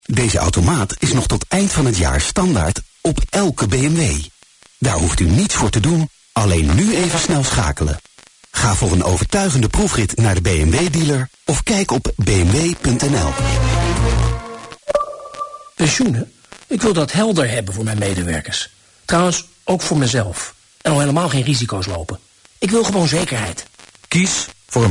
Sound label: holland id, tropo